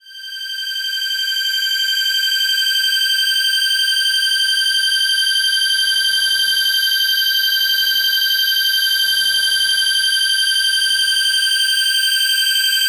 Return Home Kawaii G5

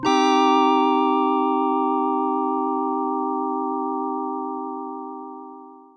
Square Synth Chord

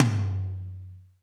Tom Hall Mid

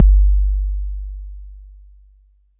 BD; TRX

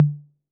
Tom Mid A 808, Clean Digital

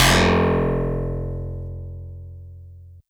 Harpsi FM DX100 F1

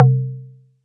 Perc C3

